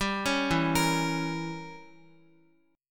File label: E Diminished 7th E, Guitar; arpeggiated